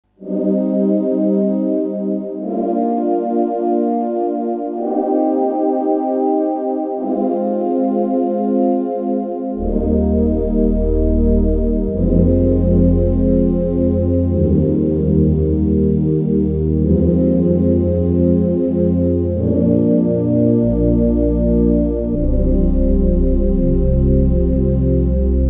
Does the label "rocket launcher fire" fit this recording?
No